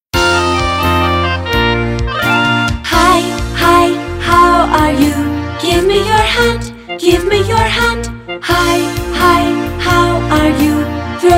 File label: hihi